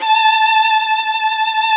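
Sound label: VIOLIN VIBRATO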